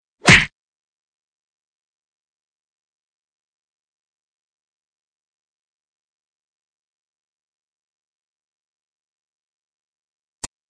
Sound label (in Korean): Fights, 싸움-전투; 헐리웃특수효과음